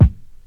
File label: hot kick drum single shot F# key